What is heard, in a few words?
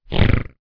hoglin; mobs; extra mobs; mobs mc